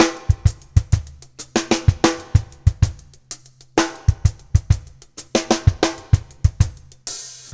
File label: Drums, Jazz